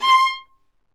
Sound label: Vas1 Marcato